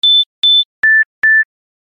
Step Beep, ringtones; Lucid 4G VS840; LG

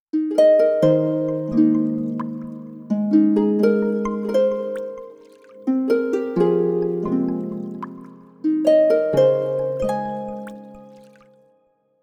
Sunrise View Harp, ringtones

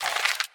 transition

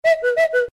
Message, notifications; Qiku; Luna Youth

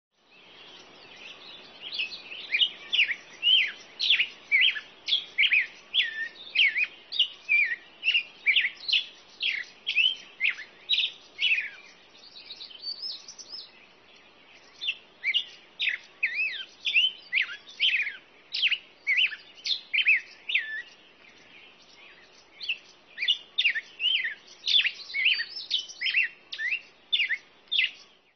Birds in the Dawn; SKY IM-U210K

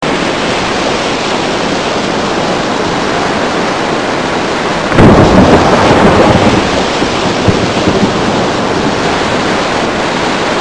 heavy Rain; i-mobile 5511 3G

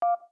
DialPad, Beep; GS390 Prime; LG

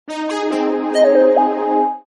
Forward, notifications; China Mobile; A3s

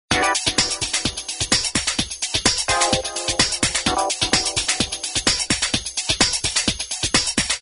Lounge, ringtones; Magic

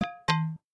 Stone Hit, notifications; Samsung Galaxy S25 Ultra

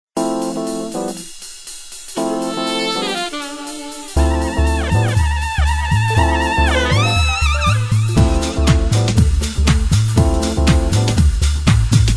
Jazz; Sferia MAYA

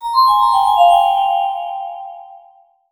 E-mail; Sony Ericsson; Xperia X1; X1 Family 2

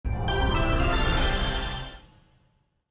Power Off; LG; Optimus-G-F180S

